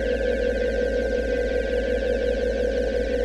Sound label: Hover Car; Megacity-Sample; Cars; UnityTechDemoCars